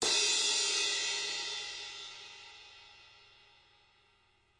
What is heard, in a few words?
Crash, drumkit; REAL LIVE KITS; Osama Qureshi DrumKit